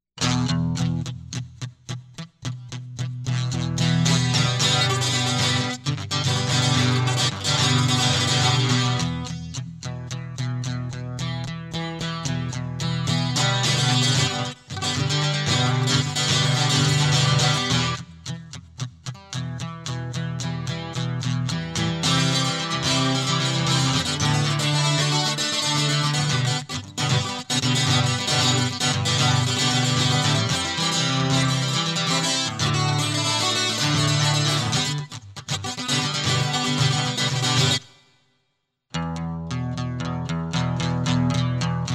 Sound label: GTR Loop